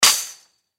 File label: Impact Fence Metal Hit Strike; Sound Effects:Materials; Blastwave